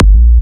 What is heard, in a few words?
Jump Kicks, Bassdrums